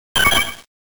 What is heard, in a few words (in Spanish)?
Grito de Taillow